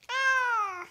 meow

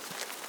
Grass footstep

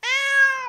meaw

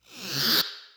appear

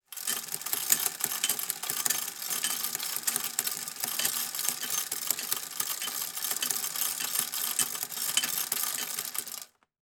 Chain Sound

